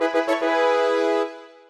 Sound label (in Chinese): 弹出结算; LinkGame; LuaDemo; 通用音效